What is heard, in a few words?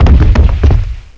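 large, impact explosion; SIN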